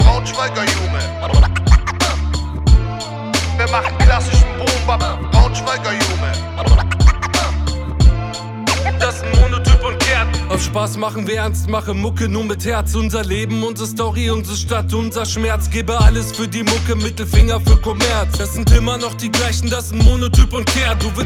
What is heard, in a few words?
boom bap